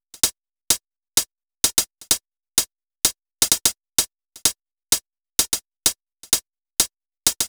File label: Electro Loop 128BPM; Vengeance Samples